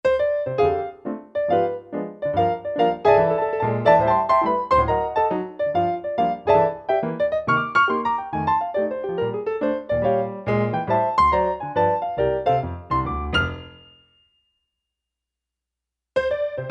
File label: piano unison tuning